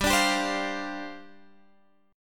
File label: G 7th Suspended 4th G down Guitar Standard; strummed; capo 9